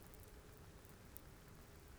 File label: pulses, BALAENOPTERA; MONACO 2022-07